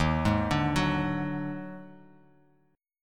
D sharp Minor Major 7th Double Flat 5th D sharp, down, Bass Standard; arpeggiated; chord